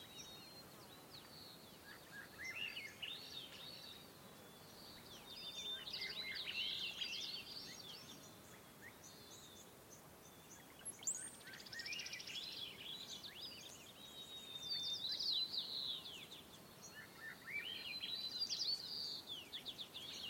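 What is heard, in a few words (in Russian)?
утреннее беззаботное безмятежное пение птиц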